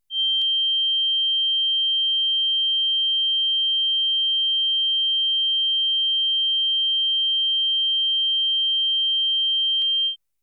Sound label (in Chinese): 讯号测试 3.15KHz; 雨果发烧唱片-发烧碟一